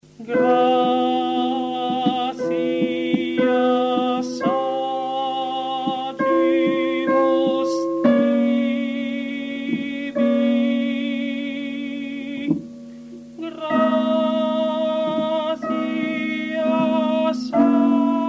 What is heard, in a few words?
Soprani; Vivaldi Gloria; Gratias agimus Tibi